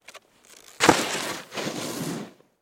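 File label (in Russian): zvuki snouborda, snowboard sounds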